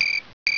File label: CRICK